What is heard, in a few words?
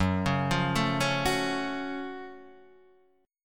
Gb Minor Major 7th Gb down Guitar Half Step Down